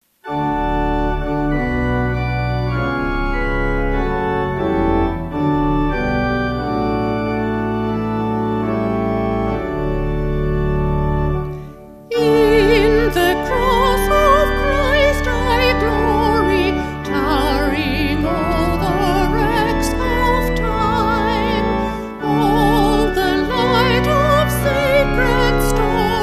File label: In The Cross Voice Organ; Across the sky the shades of night; St Oswald